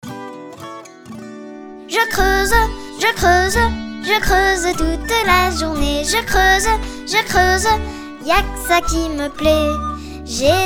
Chanson La taupe

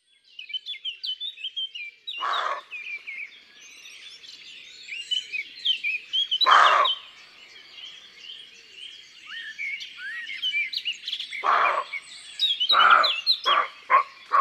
Chevreuils surpris fauvette a tete noire et merle noir Valgorge Ambiances Sonores d'Ardeche

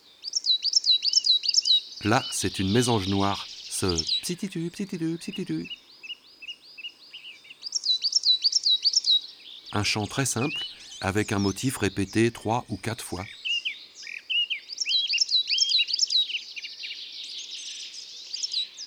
Mesange noire; ABC des chants d'oiseaux

